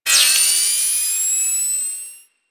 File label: Beam Fire Loop Start; Warframe; Veilbreaker; Venkra